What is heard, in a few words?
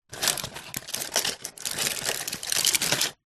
household medicine nicotine gum foil strip remove from card box; zapsplat